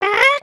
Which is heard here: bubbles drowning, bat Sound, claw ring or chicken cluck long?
chicken cluck long